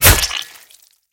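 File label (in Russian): разрубленный напополам спелый сочный фрукт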